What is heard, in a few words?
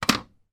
household domestic smoke detector unit place down on surface